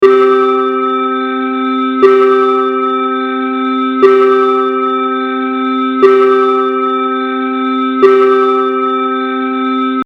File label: ping